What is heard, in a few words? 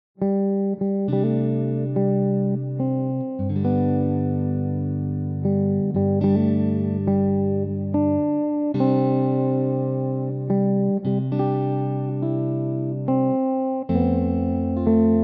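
Happy Birthday Classic; jazz facile; riarmonizzare un brano col back cycling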